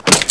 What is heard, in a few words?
Sound CLICK; My Science Fair